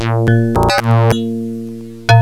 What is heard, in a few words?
ENSONIQ DX SOUNDS